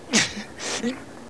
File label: pain; GoldSrc